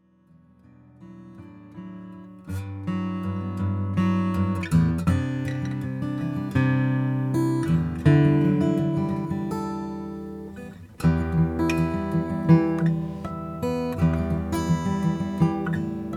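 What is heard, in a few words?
huss dalton custom ds sinker mahogany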